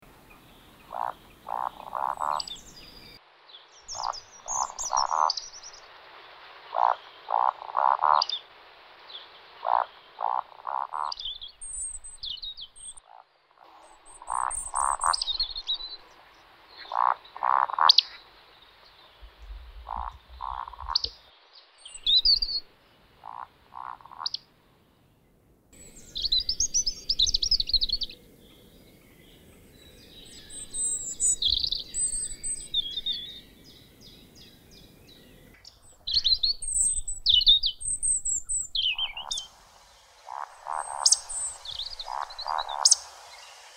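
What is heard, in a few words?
woodcock; validshnep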